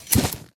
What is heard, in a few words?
saddle unequip, horse; Minecraft